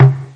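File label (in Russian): том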